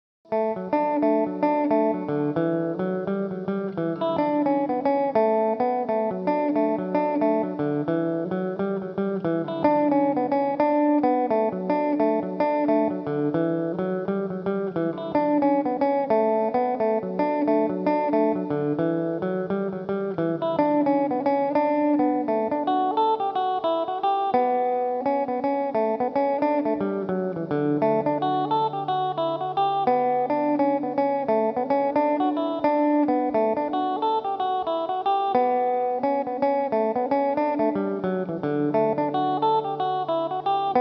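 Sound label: jig d major, tenor mandolin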